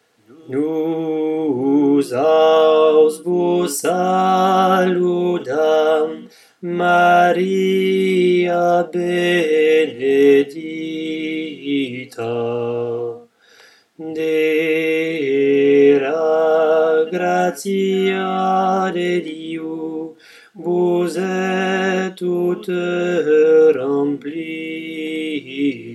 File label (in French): basse; ave maria de bareges